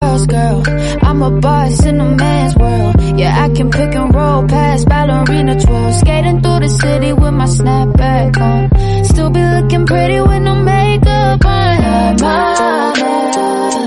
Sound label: English Song